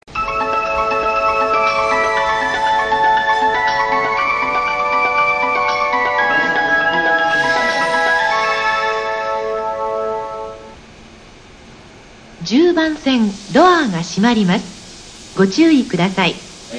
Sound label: shinjuku melody, Tokyo Train Tunes; japan